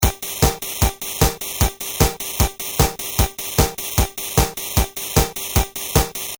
Rhythm Pattern Patch 1, Tr 505

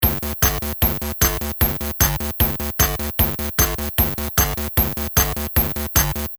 Rhythm Pattern Patch 2 plus Bassoutput, Tr 505

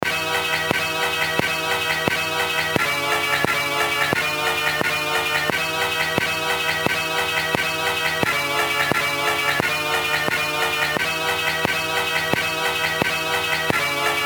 wersi

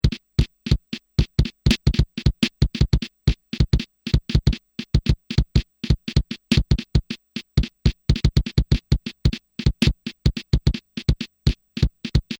DD10 stream; drumcomputer; Randomstream 1

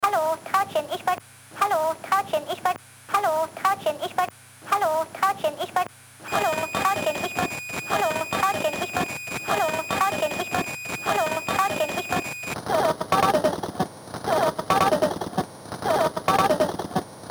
wersi bending

